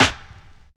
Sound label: wet snare drum sample c key